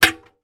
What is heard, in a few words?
household object scrape or hit inside washing machine drum